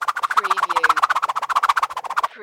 Whip Boomerang Whoosh; Sound Effects Bible

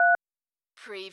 dialtone; Button / Multimedia Sounds

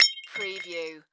Debris Glass Drop

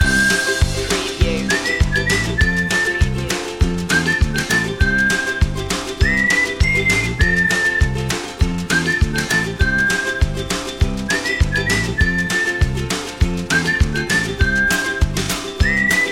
upbeat ukulele loop

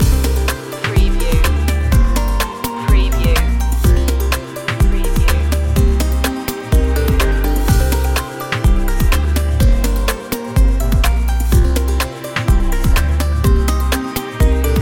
loop, chill

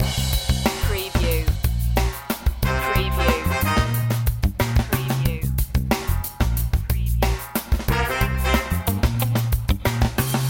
sax machine loop

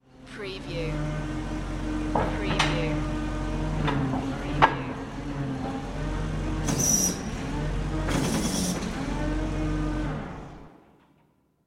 Hi Lo Drive Up Shut

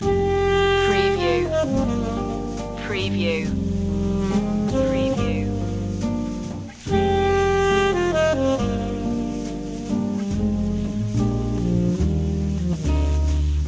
loop

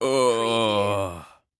Moan Male

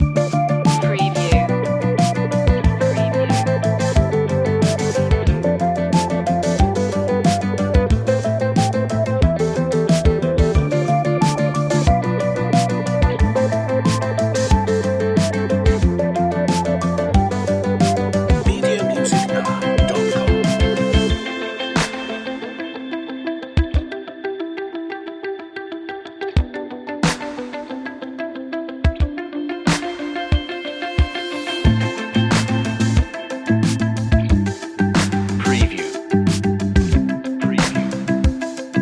analog epic loop

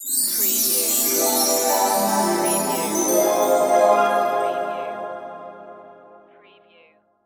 Musical

